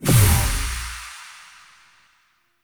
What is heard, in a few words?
Rifle Semi Mode Switch; Weapons; Warframe; Zariman